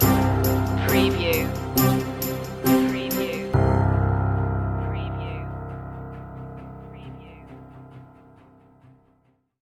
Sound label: big celebration stinger